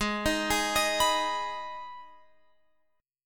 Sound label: Ab Minor Ab down Guitar Open F; arpeggiated